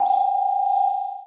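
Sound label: electronic data transmission sounds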